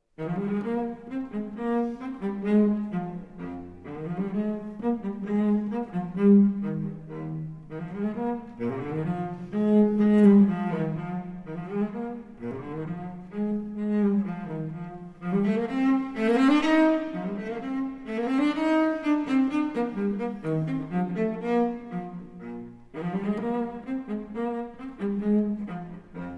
Cello Suite in E-Flat Major, Bourrée; No. 4; BWV 1010 V. Bourrée I - Bourrée II

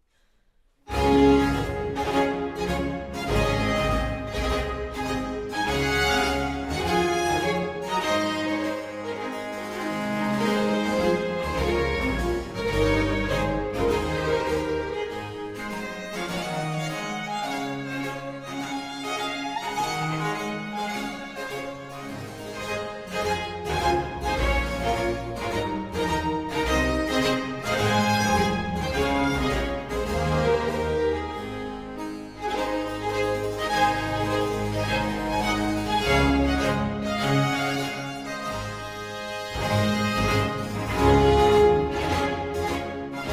Suite in D Major For Strings & B.C. Ouverture

